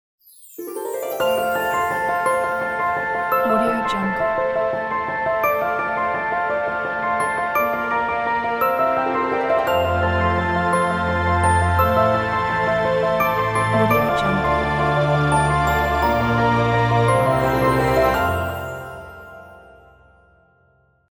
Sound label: magical story ident